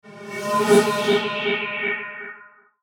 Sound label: Logo Whoosh Filter Delay; Blastwave